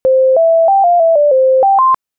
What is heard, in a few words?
sine song; adlib